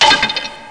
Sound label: rock; Thief